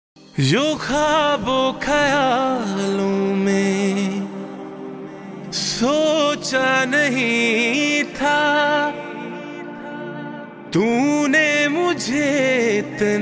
Unplugged Cover

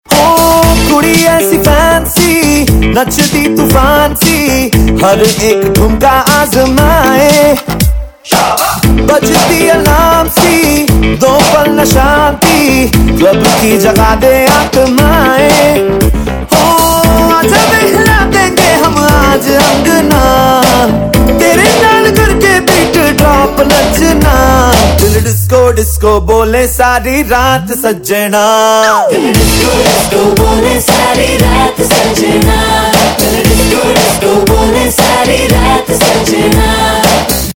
Ringtone

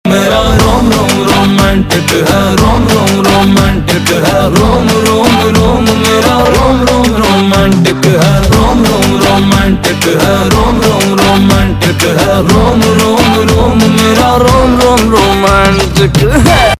Romantic

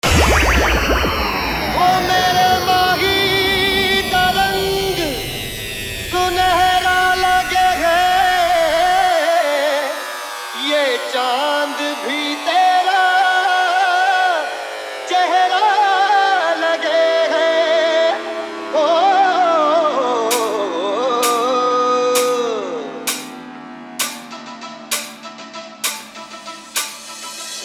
Love Hard Bass Mix

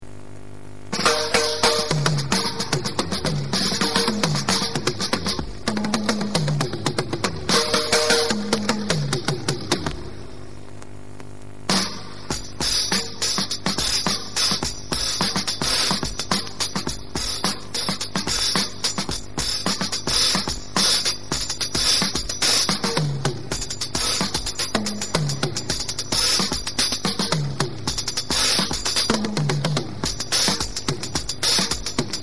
Instrumental

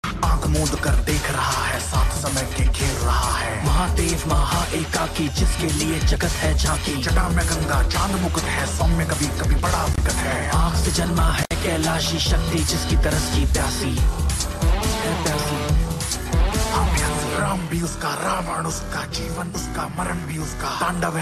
Rap Ringtone

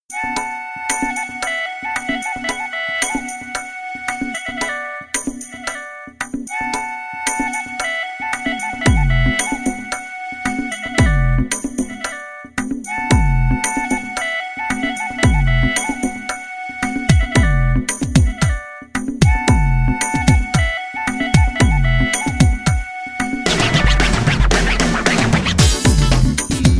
instrumental

